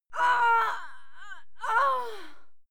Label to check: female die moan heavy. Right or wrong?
Right